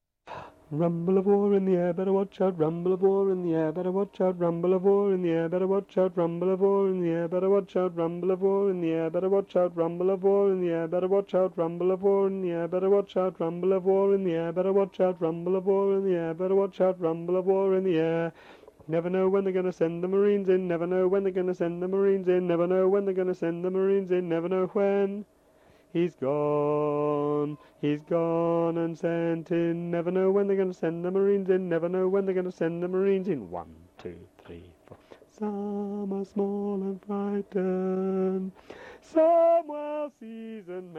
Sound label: Tenor